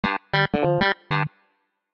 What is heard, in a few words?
Clavinet; Samsung Galaxy S23; Retro